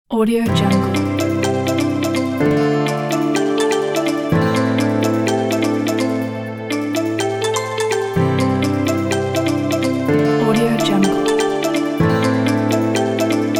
upbeat inspiring pop

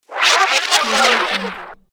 scretch rewind

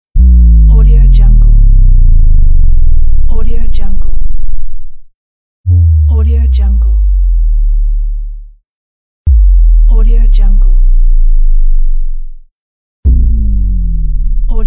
rise synth falling

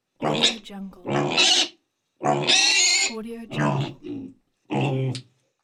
piglets

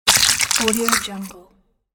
slime splat; applehillstudios

